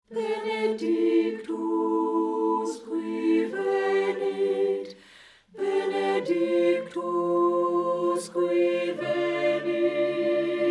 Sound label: ALTO; BENEDICTUS